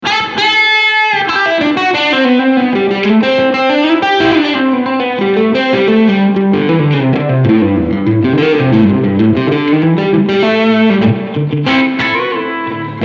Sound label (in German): Spring Reverb mit Overdrive